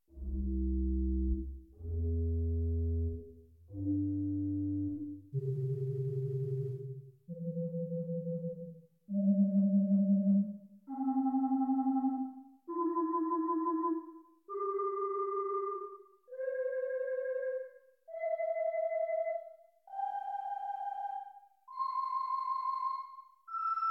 Tibia trem, organ; Paramount Middletown

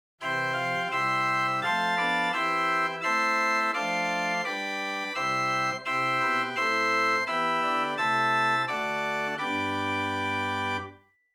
Gedackt Rohrflote Prinzipal, organ; Ott Chamber Organ

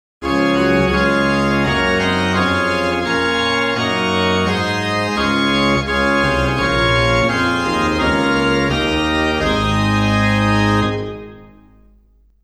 full organ; Reinhardtsgrimma

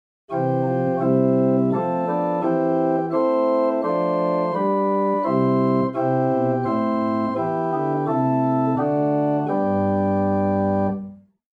Quint 8 Spitz 4, organ; Buzard Opus 3

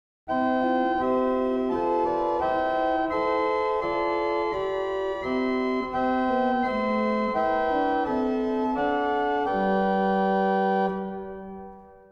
Prestant; Mainz

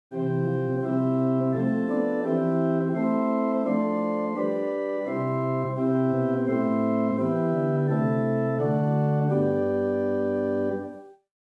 Sw Rohrflute8 Gemshorn4, organstops; First Baptist Riverside

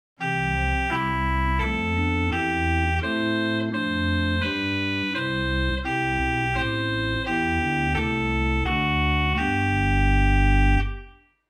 Bw Gedackt Hw Trompete Ped Sub Prin; Grace Lutheran Champaign

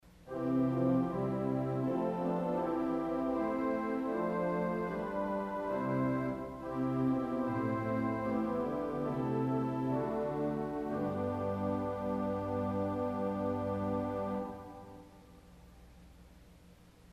Viole Celeste, organ stops; Culver Academy

